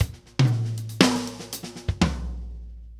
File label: New York Fill, Drum Tools, Laid Back; Sonic Foundry (Sony Creative Software); NY Fills